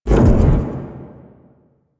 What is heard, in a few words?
Proj Hip Impact; Warframe; The New War; Quests; Weapons; Omicrus